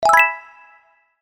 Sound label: swipe